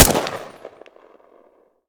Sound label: Weapon fire single ARM-16